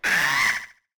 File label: Pumpkin Scream